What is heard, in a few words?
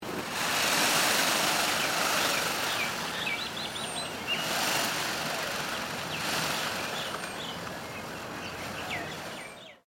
Bird Peacock Tail Shimmer; Peacocks in Victoria and South Australia; Birds; Animals